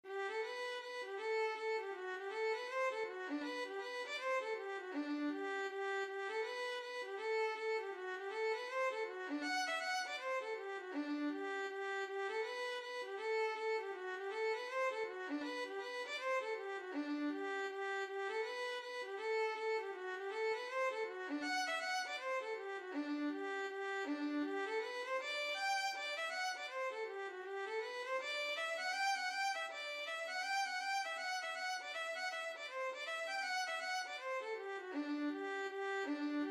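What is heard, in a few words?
hornpipe violin; kit o mahonys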